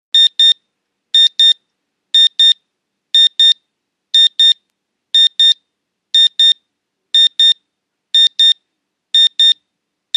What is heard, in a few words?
CLOCKS ALARM CLOCK: INT: Digital alarm clock, single tone.